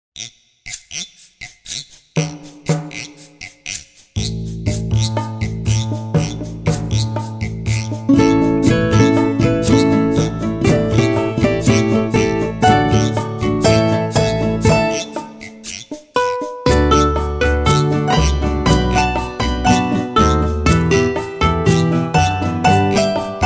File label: mexican wave backing track